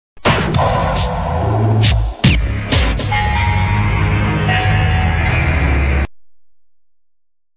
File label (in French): jingle pub; w9